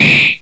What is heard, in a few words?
Damage